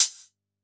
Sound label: Elec Cl Hat, drum